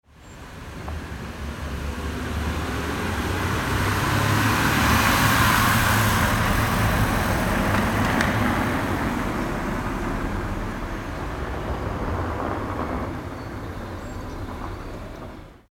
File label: car passing